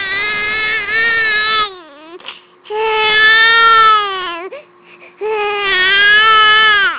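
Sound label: baby hungry, female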